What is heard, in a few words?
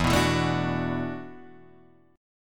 E 7th Suspended 2nd Suspended 4th, down; E7sus2sus4; strummed